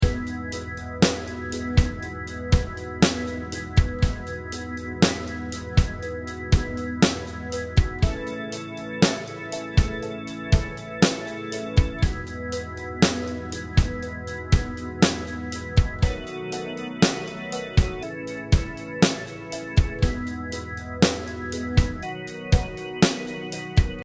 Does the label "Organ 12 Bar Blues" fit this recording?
Yes